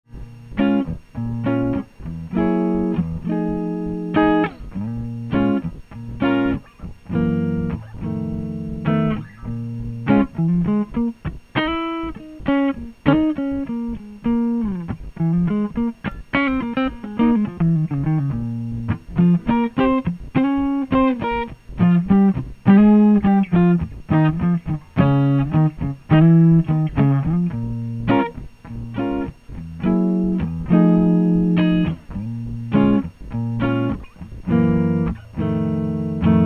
jazz, 120; jensentone

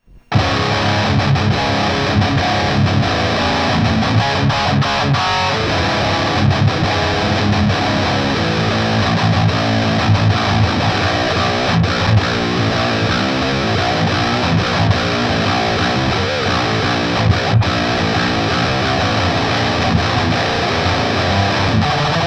jp12-100bb metal; jensentone

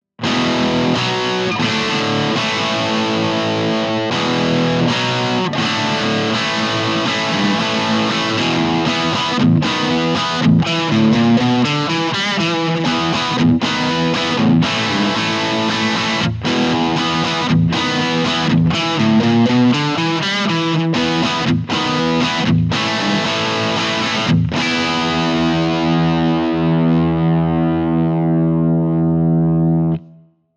rock chords; jensentone

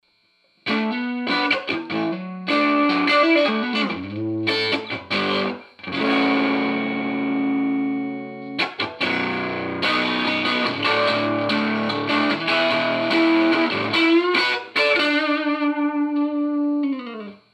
dirty

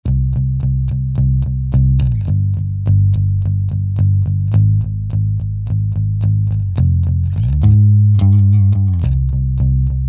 bp8 pick attack; jensentone

